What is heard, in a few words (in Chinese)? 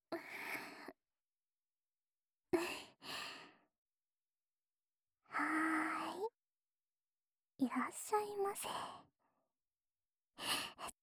掏右耳; 蹭一蹭耳朵，連帶著耳朵深處也蹭一蹭……。